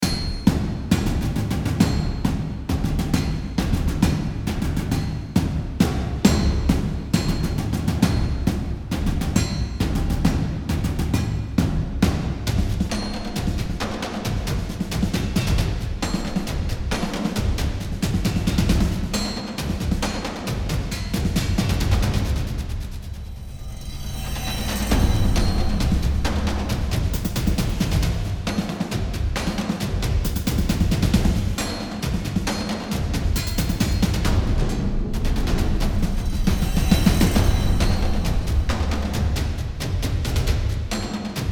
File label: Battle Intensity